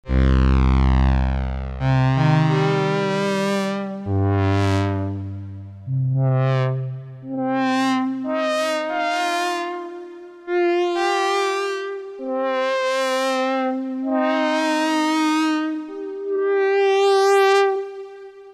synthex cs-80

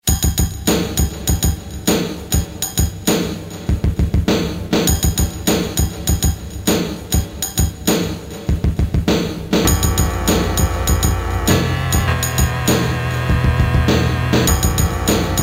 Casio SA-1 metal lead

